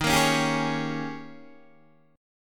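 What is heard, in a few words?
E flat 11th E flat, down, Guitar, DADGAD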